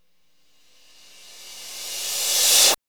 CYMBALS; ROCK

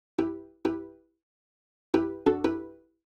strings, analog funk

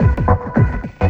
Beats, Synchro Funk; Tekno; Sonic Foundry (Sony Creative Software)

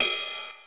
cymbal, Roland MC-909

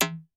Perc Reso, Vermona Mono15 Drums

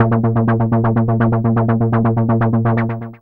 SYSTEMAT A#3, Analog Rhythm; E-MU Producer Series Vol. 6 – Analogia Project (CD 1)

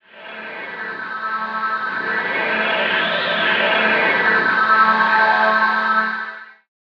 DR. LOOP, TRANCE; X-Static Goldmine 3

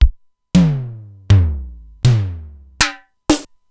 Simmons SDS-9

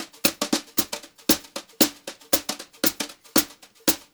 Brushed Drum Loops, JAZZY, 116

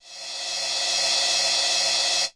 RM1X Drum Anlg Kit Reverse Cymbal; Yamaha RM1X

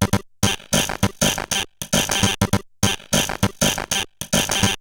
Bent RX17 (100BPM), Circuit Bent

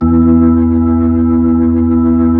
B-3

